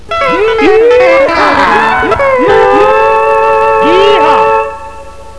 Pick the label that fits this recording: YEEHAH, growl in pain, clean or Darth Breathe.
YEEHAH